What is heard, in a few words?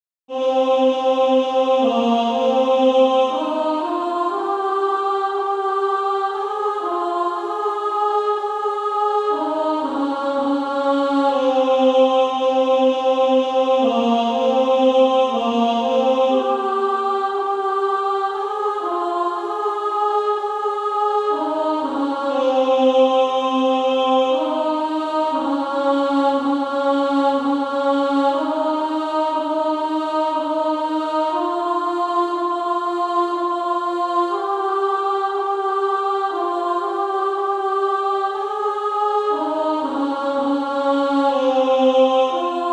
Female Choir 2A; Infant Holy